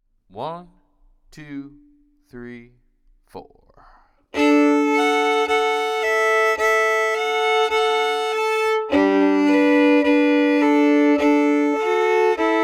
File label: prayerful hymn in d double stops, fiddle